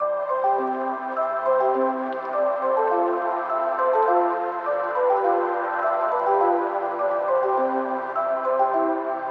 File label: melody C maj 103 bpm; bespoke - Floral v.1